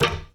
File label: steel land; Golden Crayon Sounds